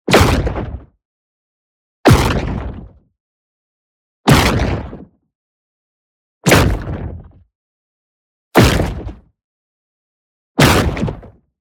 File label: EXPLOSION SMALL Trash Sweetener; boom library; Matter